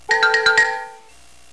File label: twinkle